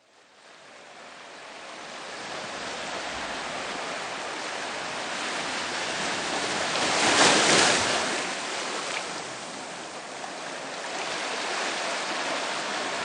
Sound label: harp